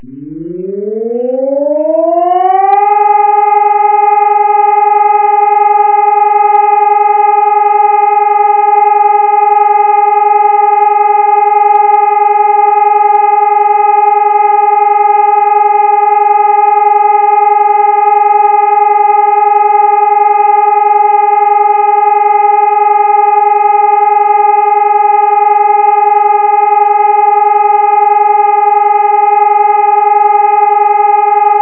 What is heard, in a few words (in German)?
entwarnung; notfallvorsorge; information und warnung der bevoelkerung